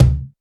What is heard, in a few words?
low mid tom drum one shot c sharp key